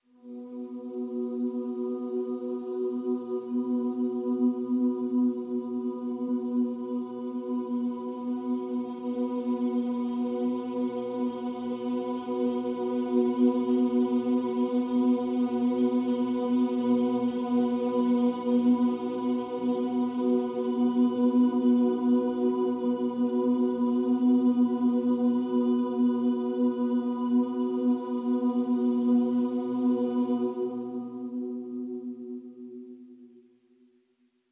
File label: Pads Vocalicious (B)